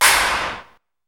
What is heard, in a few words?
metalic, Cymbals